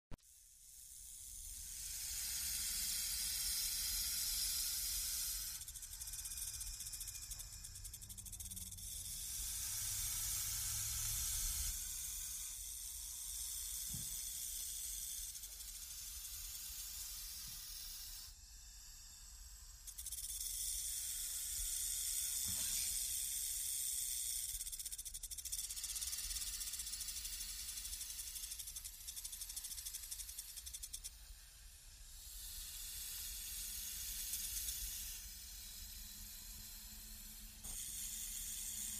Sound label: SNAKE PIT HISSES SLITHERING IN CHAMBER; wildlife sounds